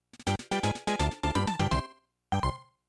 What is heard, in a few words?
game over; superstar